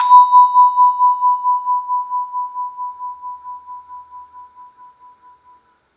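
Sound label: tubular bell; 3D Sound; 3D Keys VOL-1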